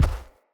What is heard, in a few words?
Step Default, Footsteps; Enemy; Corrupted Sproutfolk